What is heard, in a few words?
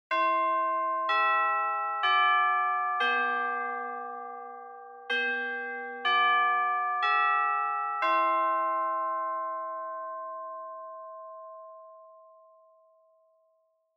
Japanese School Bell Slow Mid